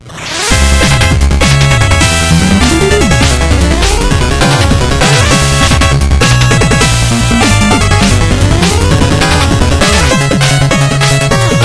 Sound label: Music Boss; Shovel Knight King of Cards